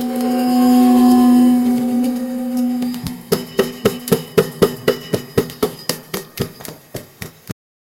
baby tiger whimperi

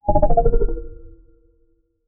a simple heavy low toned, selection

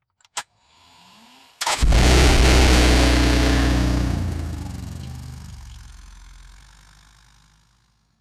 sound effect for car, ignition

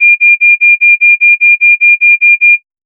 light bling ring warning

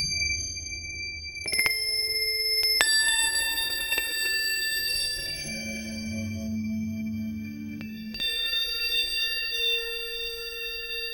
melancholic eerie bell

